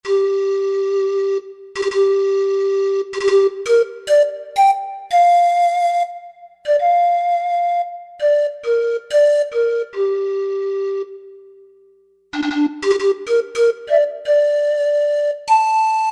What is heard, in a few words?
Pan Flute, Korg TR-Rack